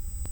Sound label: Hydrophone